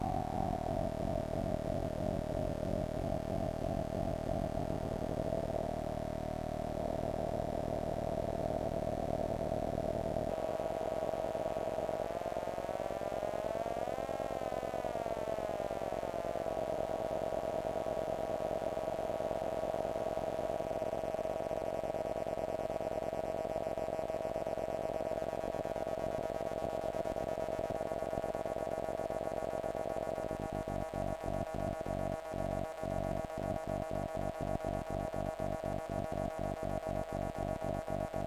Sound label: flying saucer propulsion, weird sound generator samples